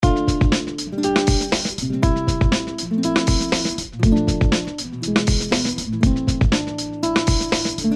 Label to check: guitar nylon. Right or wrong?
Right